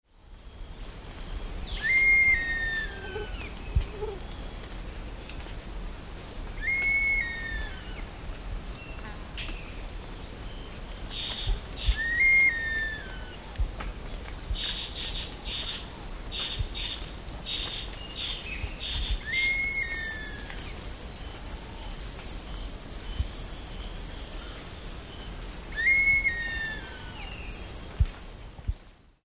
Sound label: Cryptoleucopteryx plumbea; roofvogels; Accipitrinae; Donkergrijze Buizerd; Accipitres; Accipitidae